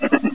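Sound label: scuttle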